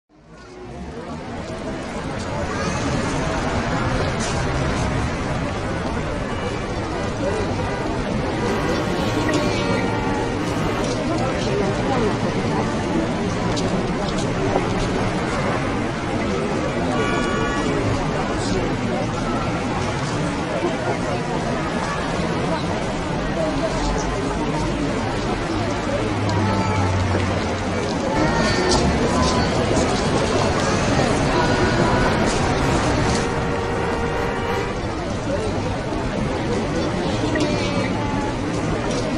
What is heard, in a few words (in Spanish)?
Ciudad Sonido ambiental